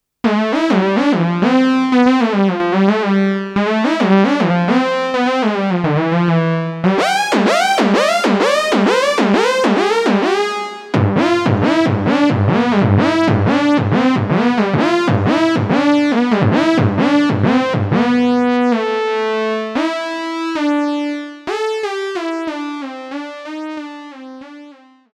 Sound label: Arp Quadra Portamentolead